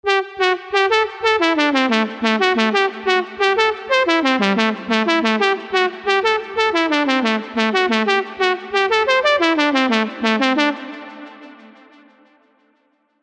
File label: 90bpm, Dorian; MIDI; Unison Dorian Advanced Scales MIDI Collection